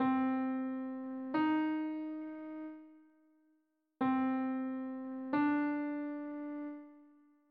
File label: half and whole steps